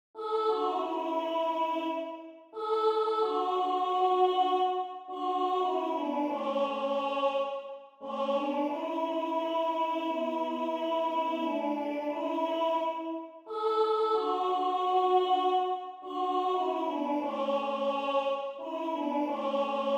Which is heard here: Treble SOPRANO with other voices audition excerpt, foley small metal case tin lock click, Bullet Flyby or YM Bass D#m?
Treble SOPRANO with other voices audition excerpt